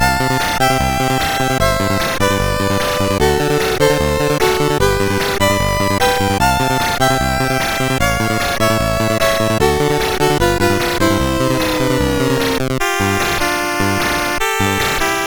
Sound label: Synth Sample, CSG8580R5; Final Synth Sample II